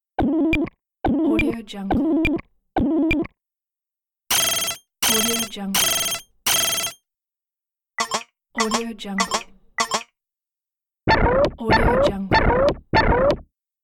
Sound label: repeatable game alerts